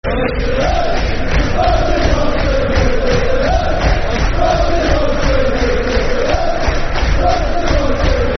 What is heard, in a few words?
Football Chants (Soccer Songs), Cardiff City